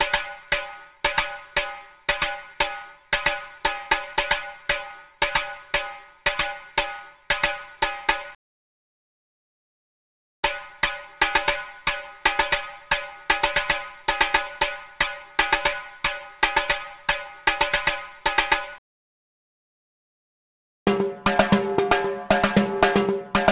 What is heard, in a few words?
Timbale 115 BPM; Перкуссии (Percussion Loops)